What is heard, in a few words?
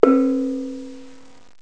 bonang, far east